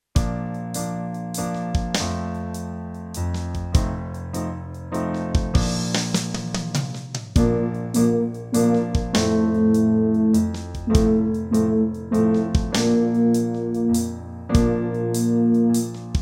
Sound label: Bb low Eb mid, brass practice